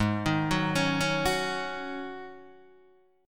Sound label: G sharp 7th Suspended 4th G sharp, Guitar DADGAD